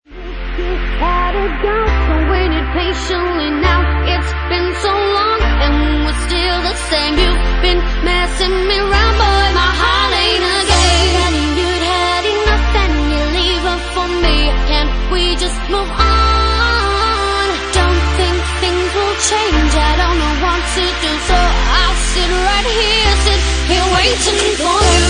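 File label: Organ Remix, Bassline House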